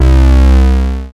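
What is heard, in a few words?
synth analog; Best Service Gigapack 1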